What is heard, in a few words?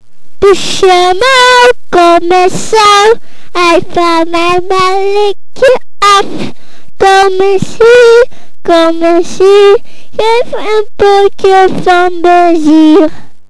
robin